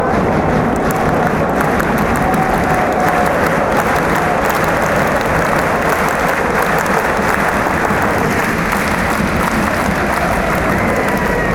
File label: claps, fanchants; hamburger